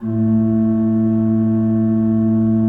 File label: A1, Cathedral Organ; ILIO; Propeller Islands Cathedral Organ